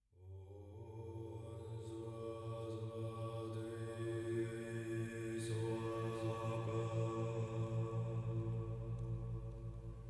Chant; Benzaiten

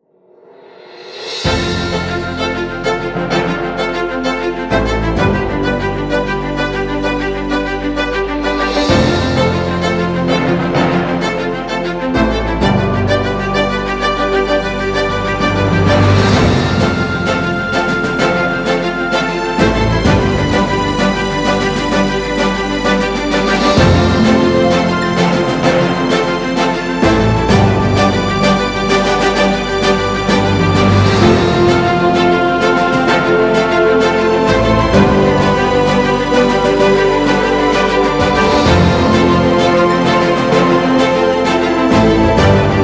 Cinematic Orchestra uplifting majestic music; urbanniAudio

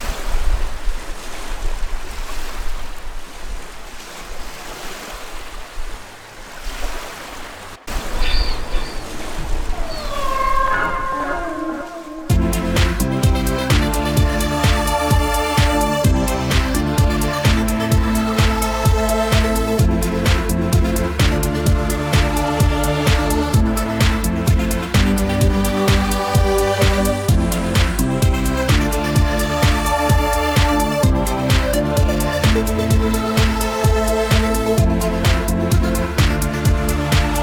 Club EDM Waves Trance Mix; Paris

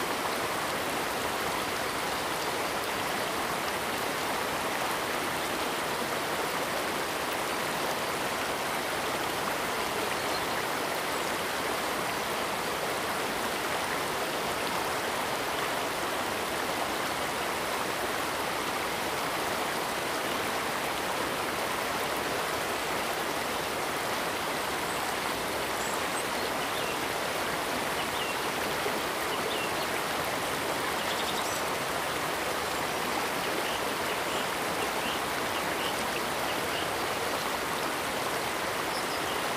Nature Sounds Birds Singing In The Rain

